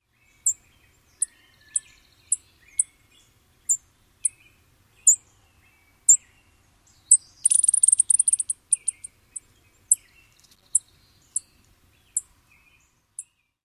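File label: interaction calls, Chipping Sparrow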